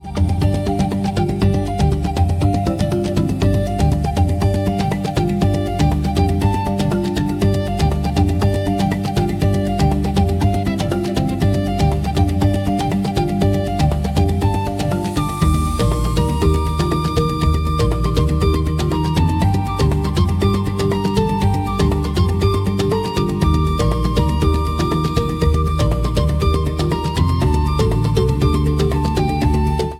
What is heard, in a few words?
tropical adventure